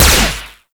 Flux Rifle Lens Shutter; Warframe; Laser Rifle; BE Flux Rifle

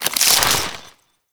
Crystal Growths Attach; Citrine

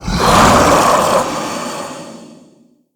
Male Vocal Attack; Characters; Enemy; Mockeries; SubBoss; Mockery

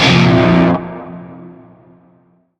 Shawzin Hirajoshi Chord A; Music; Warframe; Narmer

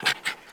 Puppy Idle Pant Short; Vocals; Warframe; Kubrow

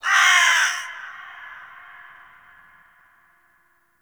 Forest Creature Vulture, Ambience; Warframe; Grineer

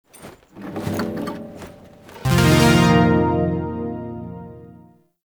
Shawzin Equip; Music; Warframe; Zariman

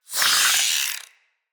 Root Spider Elite Vocal Pain, spider; Animals; Spiders; Vocals

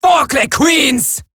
attack, female

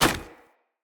Sphere Polearm Medium Impact Wood Hollow Large; Player; Environment

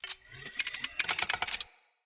Bow Charge; Sinecure

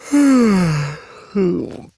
Long Sigh, Son, Vocalizations; Warframe; Deimos

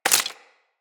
Bullet Impact Debris Wood

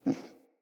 Wolf Cub Sleep Idle Yip, Animals; Vocals; Orengal Wolf Cub

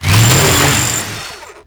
Saw Melee Swing Long, Weapons Melee; Warframe; Grn Ghoul Saw Player

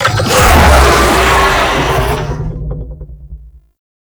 Infested Vocal Attack; Warframe

